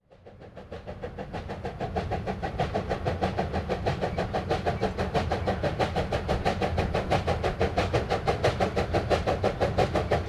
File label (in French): trains a vapeur a voie etroite; sonotheque